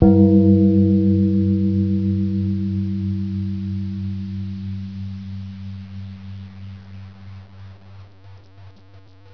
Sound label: achievement received